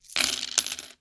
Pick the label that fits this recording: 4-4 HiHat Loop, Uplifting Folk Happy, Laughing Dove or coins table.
coins table